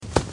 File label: footstep; fnaf